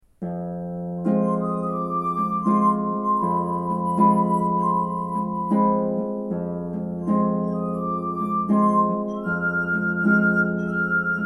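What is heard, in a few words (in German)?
Gnossienne Gläser und Harfe; Satie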